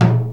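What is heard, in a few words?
Japanese PERCussion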